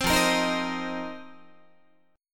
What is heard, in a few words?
F 7th Suspended 4th F down Guitar; strummed